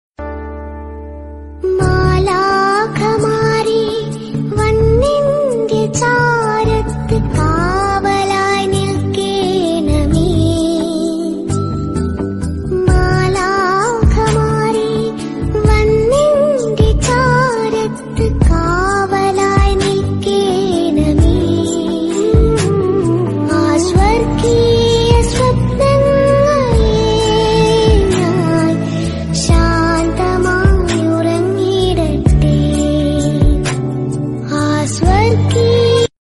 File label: malayalam christian devotional malayalam songs